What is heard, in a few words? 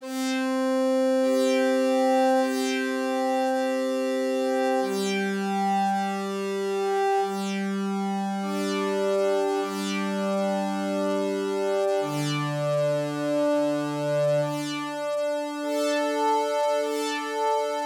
ascending fifths superimposed bright pad